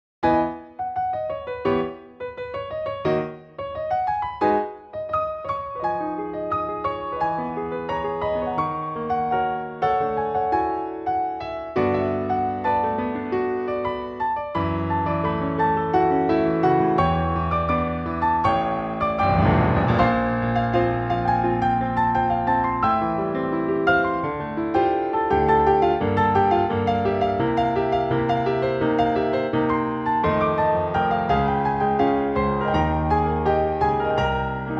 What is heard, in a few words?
piano ver.